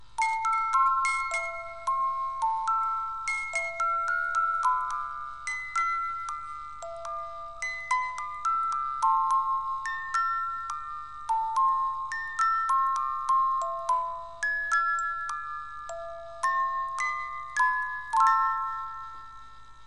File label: Music Box